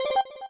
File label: Key Press, keypad; ZTE F160-Orange-Atlanta